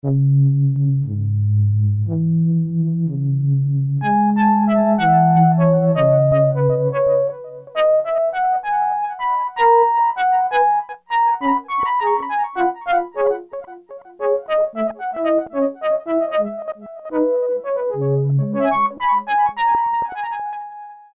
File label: Dancing Flutes, Roland MKS-70